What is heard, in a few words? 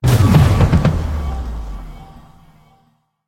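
magic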